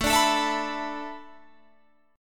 A Suspended 2nd Suspended 4th A Guitar Open G, chord